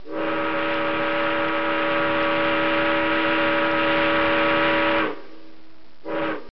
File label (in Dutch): Omgevingsgeluid trein